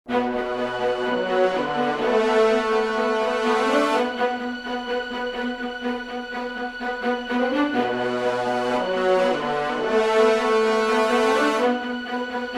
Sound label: melody horns, Motif, played by french horns; the first two bars of the piece; epic orchestral track